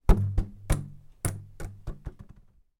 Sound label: foley balloon foil helium bounce ceiling multiple